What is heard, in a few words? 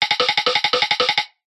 cartoon run scramble fast wooden vintage